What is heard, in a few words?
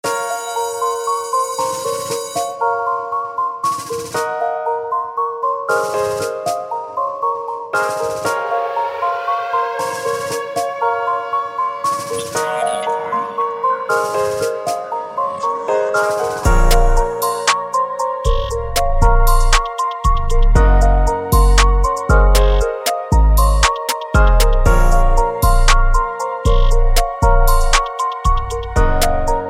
Type Beat